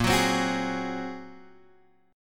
Bb 7th Suspended 2nd Sharp 5th Bb Guitar Drop D, chord; 8,9,10,11,9,x